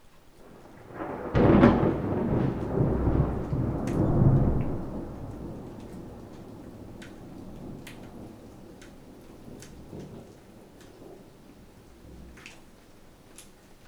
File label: Thunder